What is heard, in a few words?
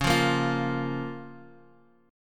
C sharp 6th, C sharp, Guitar, Open F; strummed; 13,12,10,12,x,x